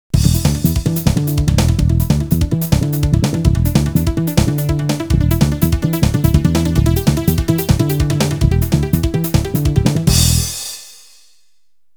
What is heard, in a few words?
Run Into a Sheep (Continue)